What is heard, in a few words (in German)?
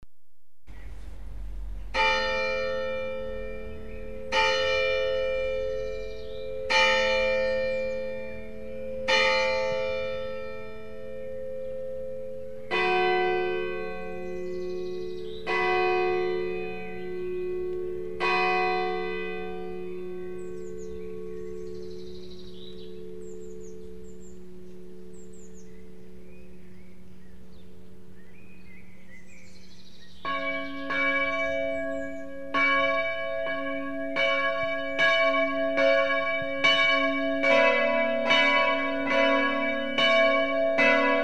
Glocken Kirche; Paul-Gerhardt-Kirche